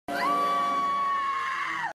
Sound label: horror girl yell